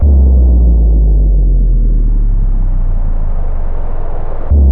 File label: Boom; Zero-G Ambient 1; MACHINEDRON1